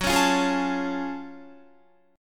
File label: F sharp Minor Major 9th F sharp Guitar; strummed